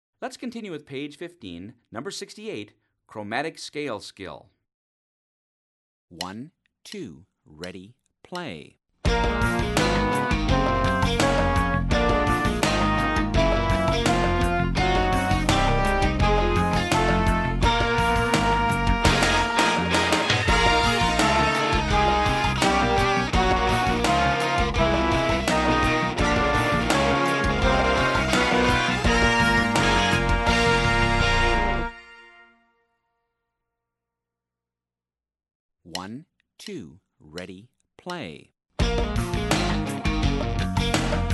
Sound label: chromatic scale